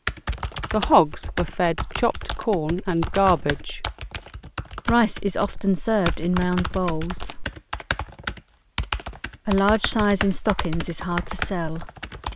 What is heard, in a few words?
Keyboard clicks original conditions